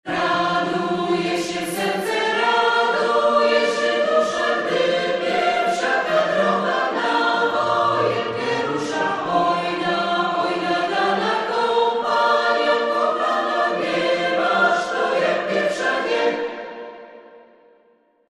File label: acapp